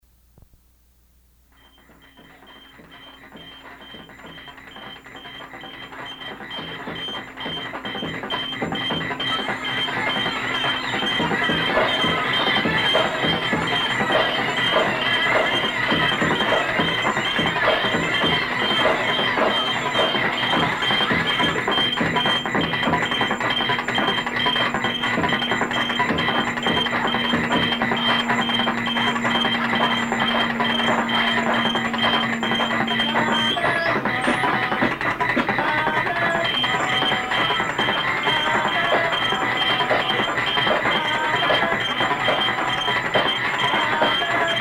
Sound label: Hot Crickets